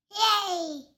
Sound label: yay